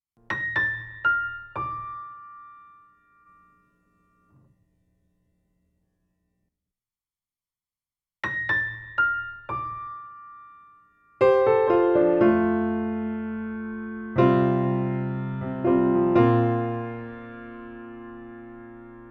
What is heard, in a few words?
Piano; 60th Gen MOTU 1296